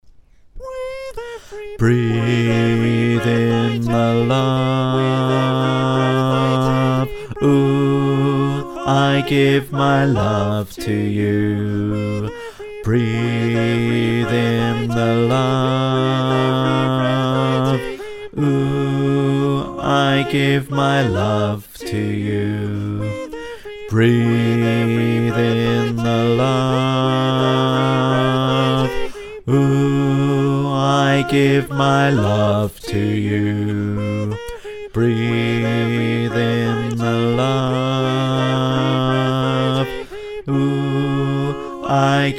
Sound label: Bass, choir